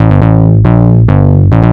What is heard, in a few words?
bass 138 g, bass-licks, Techno; instrument-loops